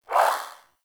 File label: Swing, Weapons Melee; Warframe; Modular Melee